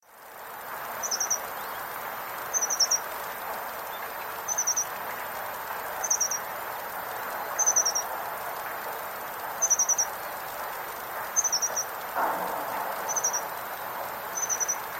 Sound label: Siberian Accentor